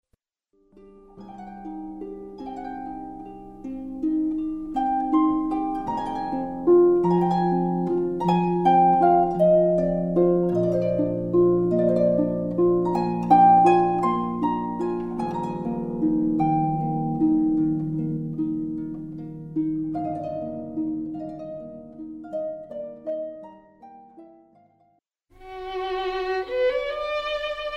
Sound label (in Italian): esercizio timbri; Sonora vol A